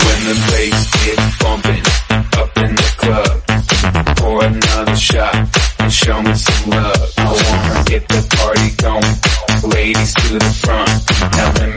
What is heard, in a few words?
130bpm, A